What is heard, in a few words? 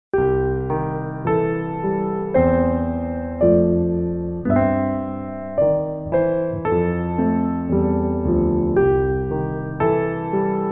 duet